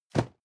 cardboard box medium place hard; objekte

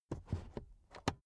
car headrest full adjustment soft unsteady

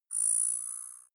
zoom in long; signale

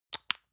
lotion lid close medium; friseur; berufe

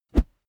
whoosh bamboo high

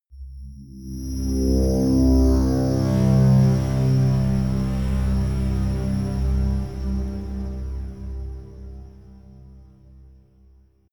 scrapes ringing buzz blitter, drones; musikelemente

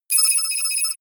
computer sound, signale; soundpool

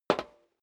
step high heels metal dry single right; menschen; schritte

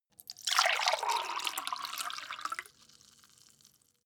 glass pour fast; soundpool

